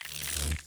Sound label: Pink Laser Prepare; Hollow Knight; Mines